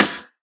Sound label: Snare